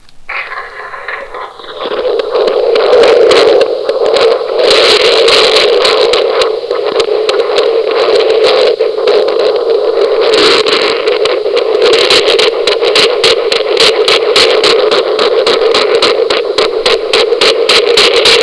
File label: heartbeat